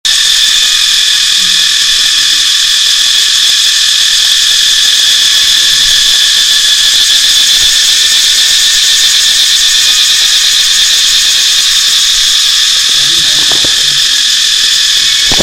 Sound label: cicadas at Hellfire Pass